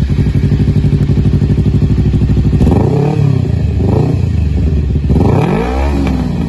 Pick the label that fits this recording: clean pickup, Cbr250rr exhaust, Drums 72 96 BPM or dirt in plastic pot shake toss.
Cbr250rr exhaust